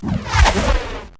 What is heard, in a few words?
Ice Elemental Attack Sound; Heroes3